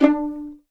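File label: D4 pizzicato forte right, violins; East West; The Ultimate String Collection; VLNS PIZZ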